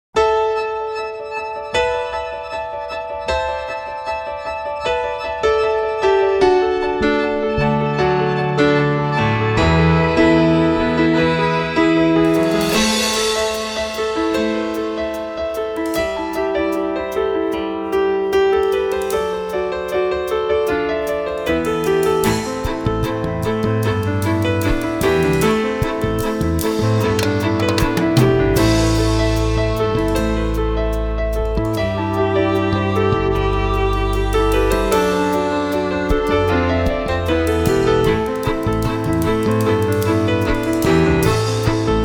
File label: Waves Instrumental